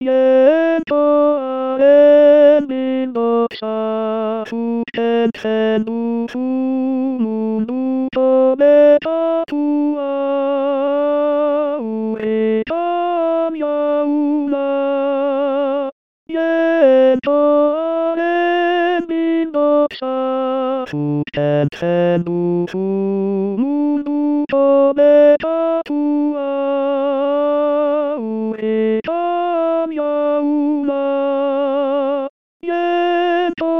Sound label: Jaink Bild Tenor